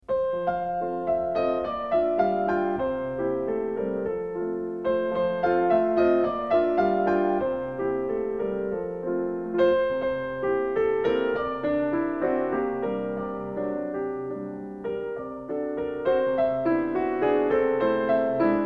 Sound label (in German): Kinderszenen für Klavier; Wilhelm Kempff; Op 15; Au coin du feu